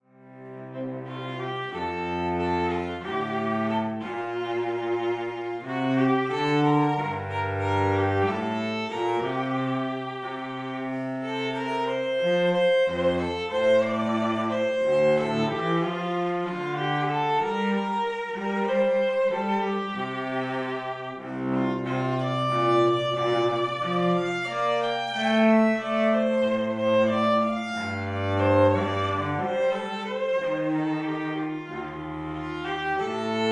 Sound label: String Duet Wedding Music